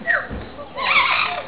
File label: parot voice